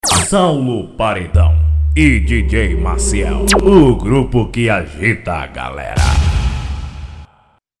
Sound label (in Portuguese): vinheta